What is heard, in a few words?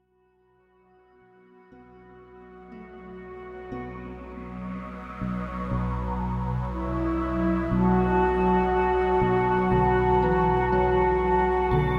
Healing Winds